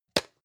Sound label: plastic can small lid close hard